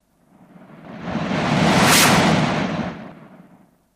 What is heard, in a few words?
Pod By Phaser Blast, Pods